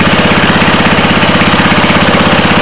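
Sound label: mower off low exterior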